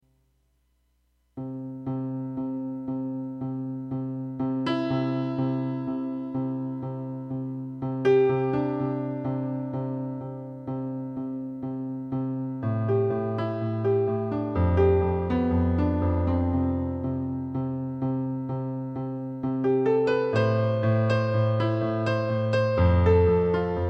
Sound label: piano; lyrica